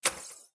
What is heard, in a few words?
new event; FightingGames; TWBlue